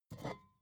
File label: bottle vodka pick up slide soft; berufe; gastronomie